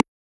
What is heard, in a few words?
put; 2048 plus